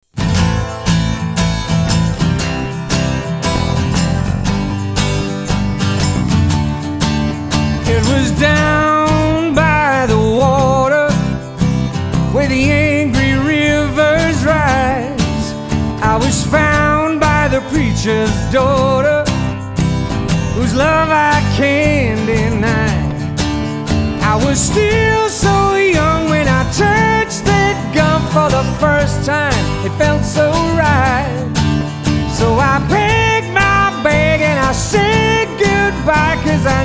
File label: acoustic